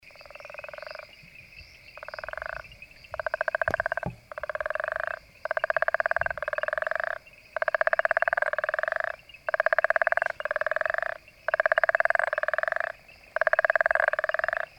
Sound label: bufo gutturalis caprivi, animal songs; Guttural Toad